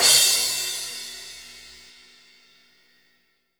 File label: Crash, Live Kit; Sonic Foundry (Sony Creative Software); Ugly Remnants VOL-2; Single Shots; Beatstuff